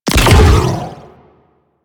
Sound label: Riot Moa Disc Fire; Spec Ops Riot Biped